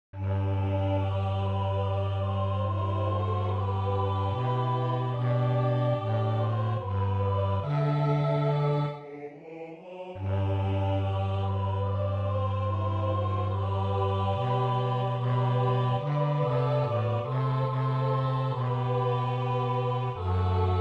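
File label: Lead Kindly Light, SATB choir and congregation, This is a SATB arrangement for choir and congregation.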